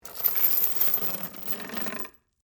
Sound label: Chains Wood Box, Industry, Chains, Raise, From, Wood, Box; Factories; BlastWave